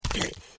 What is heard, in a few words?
trog foot run, street trog